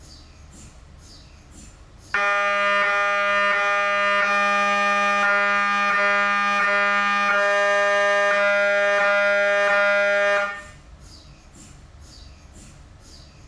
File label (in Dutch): mri geluid